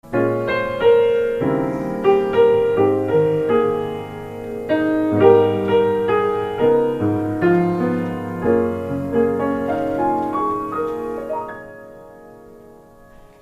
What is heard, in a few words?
piano; WNEW theme